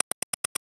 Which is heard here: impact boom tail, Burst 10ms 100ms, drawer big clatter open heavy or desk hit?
Burst 10ms 100ms